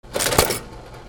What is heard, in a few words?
INDUSTRY